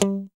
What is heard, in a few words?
GTR FNK GTR, Clean E.Gtr, Strats; Roland L-CD701; Clean E.Gtr4; PYB Strats